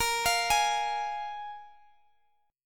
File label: F Minor Double Flat 5th Bb